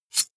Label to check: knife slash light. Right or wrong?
Right